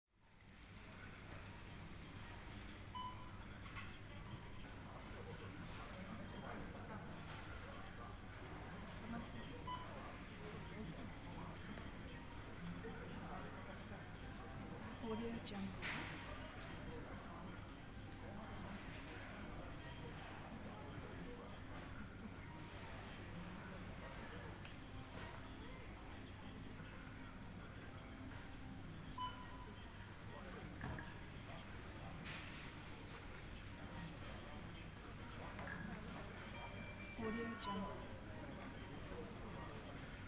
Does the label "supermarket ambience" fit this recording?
Yes